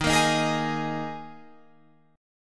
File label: Eb Suspended 4th, Guitar, Open F; Ebsus4; strummed